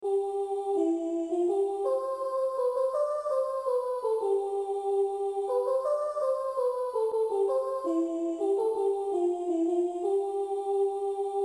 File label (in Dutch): sopraan midi